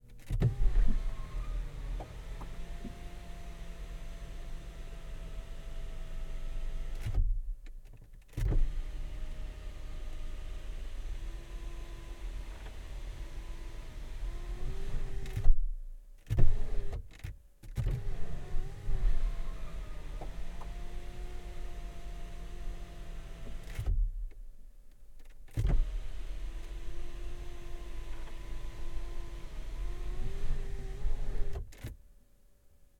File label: Range Rover SDV8 - Sunroof Open and Shut - INTERIOR - Front Window; Pole Position; Range Rover 4.4 SDV8; VAR SFX; DPA4061